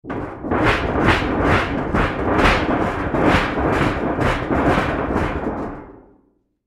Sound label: Metal Wobble Sheet, Metal Wobble Sheet Shake; Foley; Blastwave